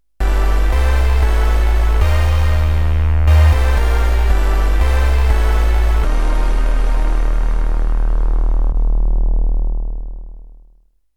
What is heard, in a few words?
Octapad